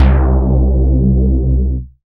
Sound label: Synth Bass, Roland